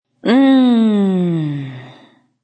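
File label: Female Relaxed Sigh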